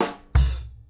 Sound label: FILL